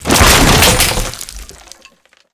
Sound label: favela shutter detail; Call of Duty Modern Warfare